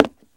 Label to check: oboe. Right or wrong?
Wrong